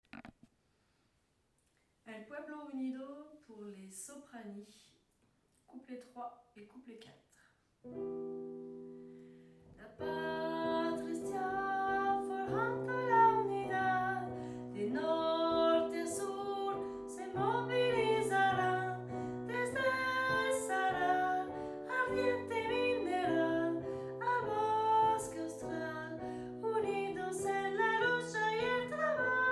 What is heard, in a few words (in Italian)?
Soprani; pupitre soprani